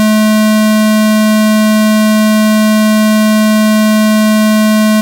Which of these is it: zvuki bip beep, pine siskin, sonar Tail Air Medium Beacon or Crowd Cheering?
zvuki bip beep